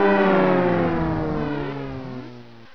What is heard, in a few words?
behind attack